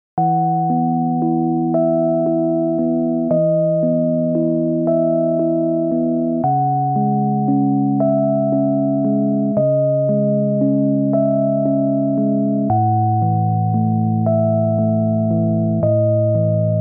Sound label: New age; 잔잔